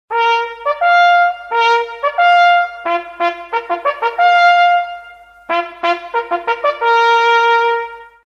hunting horn ringtone

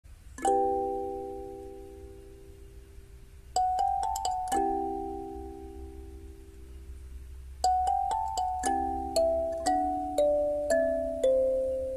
kalimba cover; ezel

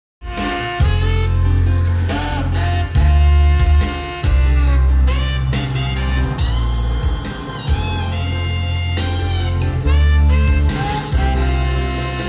saxophone

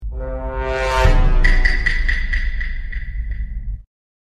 Ring; Among Us

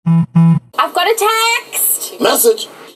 message ringtone

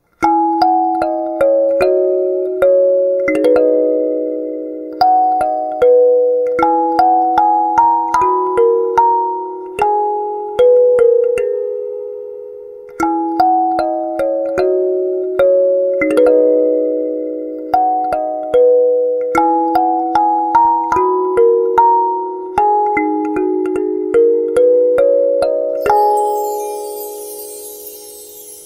kalimba cover

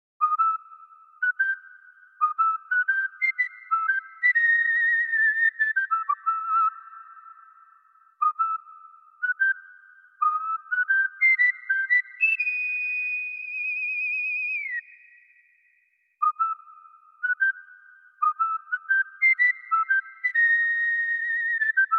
Whistle Ringtone

